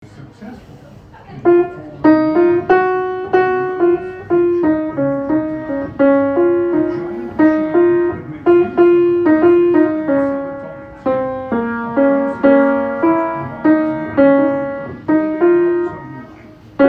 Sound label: piano